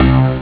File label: Harpsi Strings; ST-XX Amiga Soundtracker Sample Packs